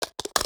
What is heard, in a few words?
inspect mag hit; EMXArms; umx32